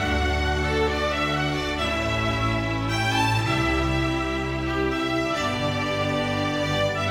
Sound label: ORCHESTRAL